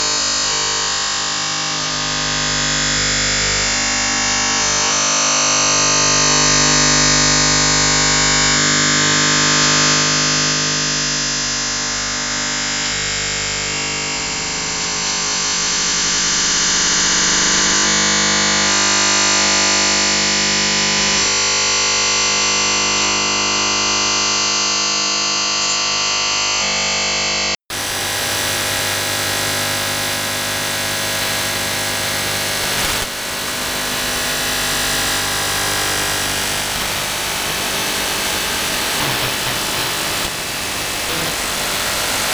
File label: Noise